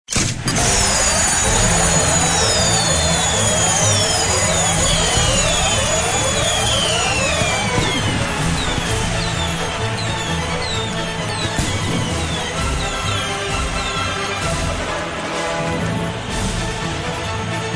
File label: winner sfx